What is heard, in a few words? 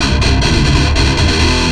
Riff, Industrial Nasty